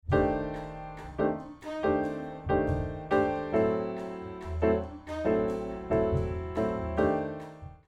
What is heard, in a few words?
Piano Raw